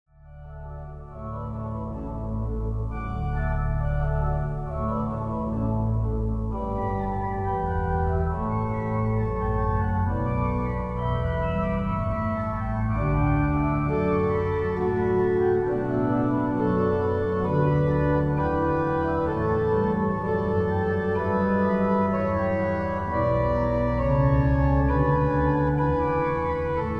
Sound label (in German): Choralvorspiel; Morgenstern